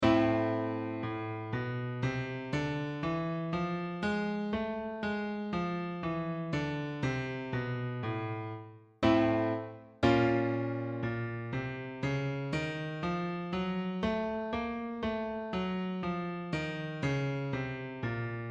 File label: Harmonic Minor, Minor Scales